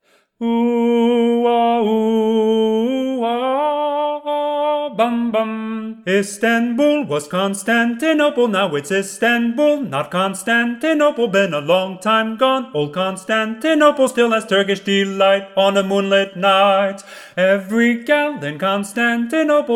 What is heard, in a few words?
Lead solo, ttbb